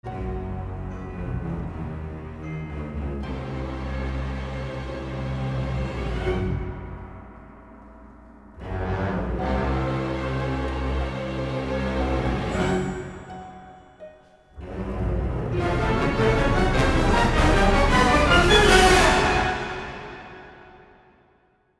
Descend Into Danger

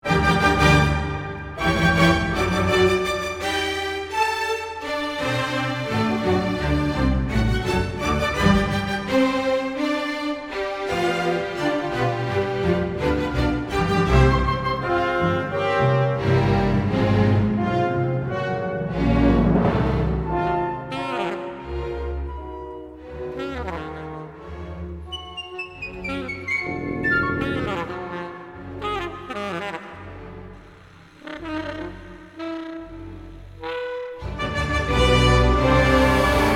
Orchestra Falls Asleep; Beethoven

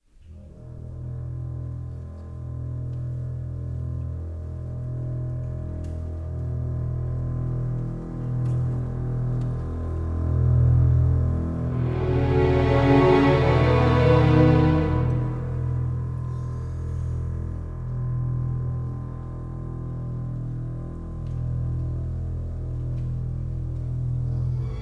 Drone To Desolation

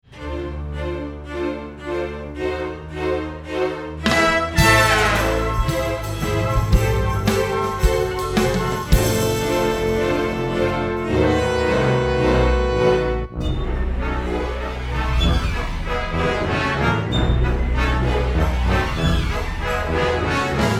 Wild Waltz

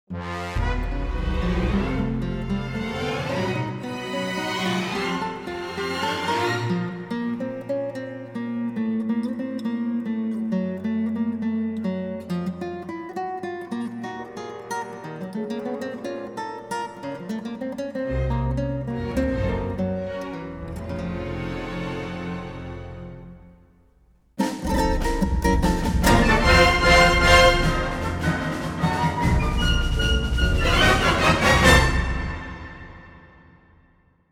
Guitar Solo Adventure Theme

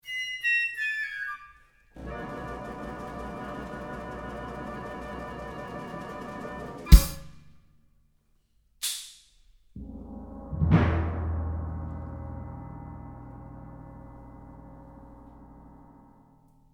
Contemporary Kookiness

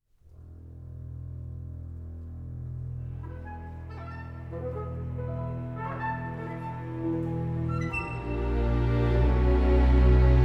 Noble Horns Westward

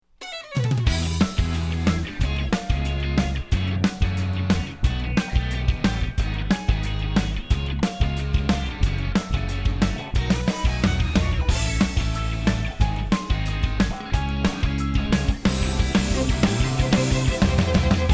Pop Theme Song

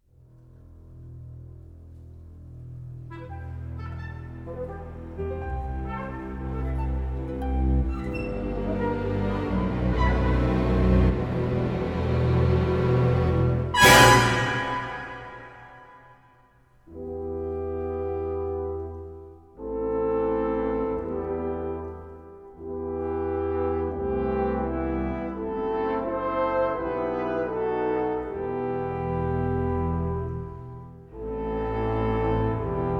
Noble Horns Foreboding

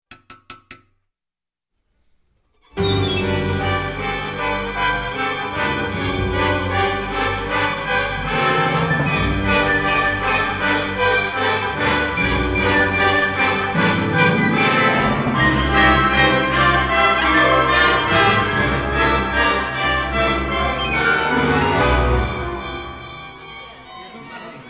Bad Orchestra Wipeout

